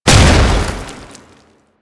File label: Tank Infested Strafe End, Movement; Warframe; Lasrian Tank